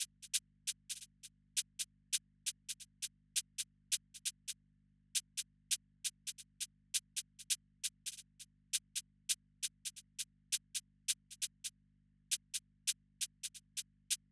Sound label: Hihat, 134bpm; G-m